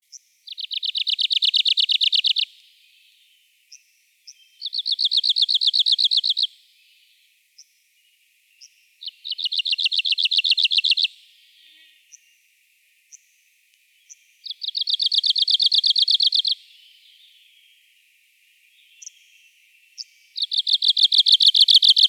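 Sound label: penochka talovka golos, Arctic warbler voice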